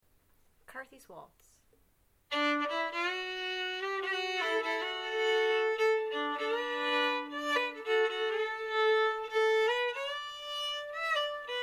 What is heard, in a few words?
Waltz; Carthys